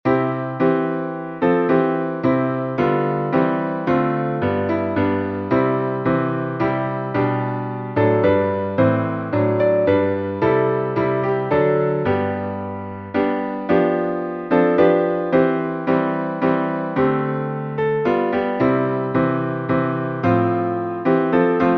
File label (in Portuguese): salmo playback